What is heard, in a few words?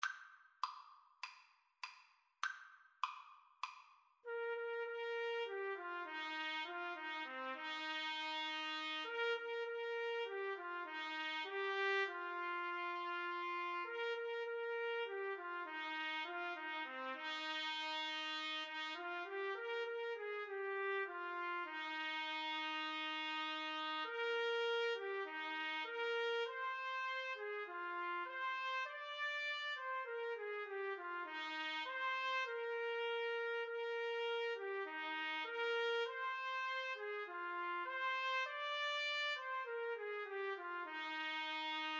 trumpet duet